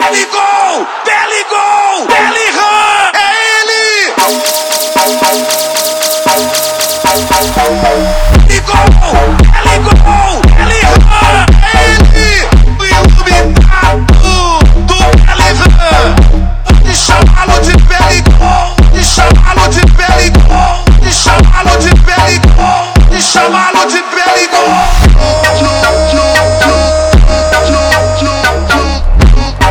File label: Funk